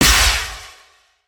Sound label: Barrier Shock; ambience spaceship